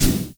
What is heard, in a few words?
Mosquito Charge Charge